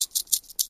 Rattlesnake, Mojave Tail Rattles. Short Rattlesnake Rattle. Close Perspective.